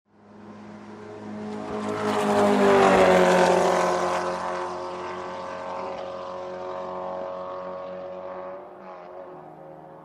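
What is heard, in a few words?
racing car passes by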